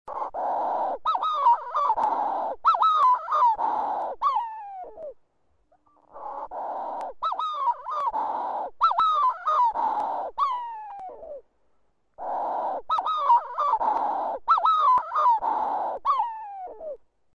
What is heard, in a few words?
Manx Shearwater